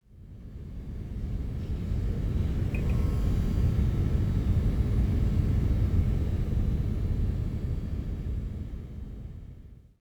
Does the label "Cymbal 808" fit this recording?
No